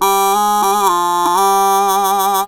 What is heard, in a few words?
Talk Box "oooh"